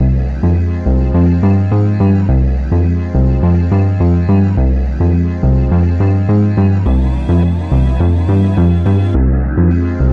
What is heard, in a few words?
Key C Minor 105 BPM